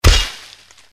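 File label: weapon sword deflect brush; conan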